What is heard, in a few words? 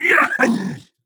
ballbot somersault; Portal 2